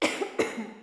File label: cough; Mafie 1